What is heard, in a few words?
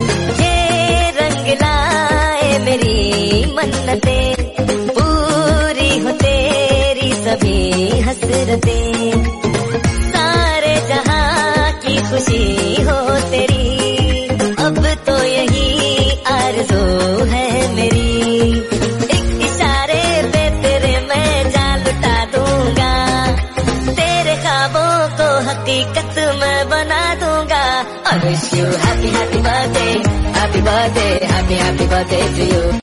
Hindi happy birthday song